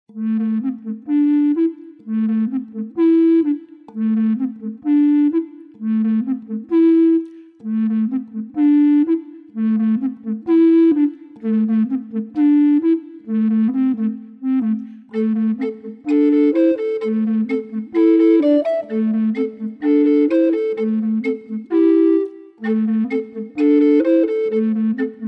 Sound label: NAF trio